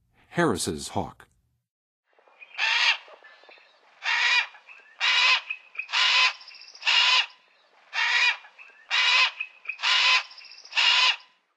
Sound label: Harris Hawk; North America; Western USA; Western USA Songbirds